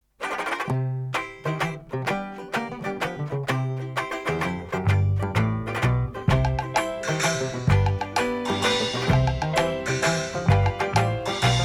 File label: puppet waltz